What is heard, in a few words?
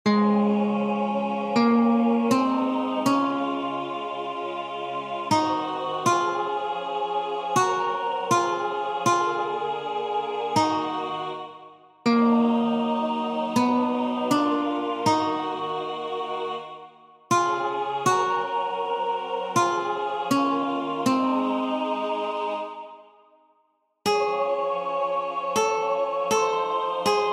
A